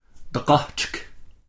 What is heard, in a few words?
bell; Dictionary